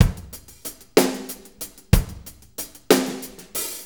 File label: 6-8 Loop, Full Kit Loops; Sonic Foundry; Loops & Samples Drum Tools